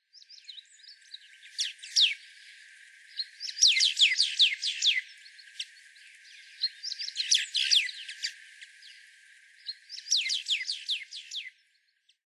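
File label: kaojirogabicho, White-browed Laughingthrush; watarase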